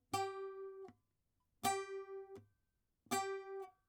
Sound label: saz